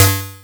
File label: perc, opl3; M8